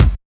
D&B Kick; neuro

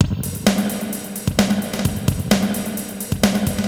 Spring Verb HR-16B (130BPM), cyborg beats

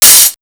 HH OPEN; Big Beat Samples & More; AKAI

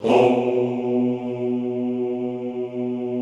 HOUUU, Classical Choir; Best Service; Extended Classical Choir

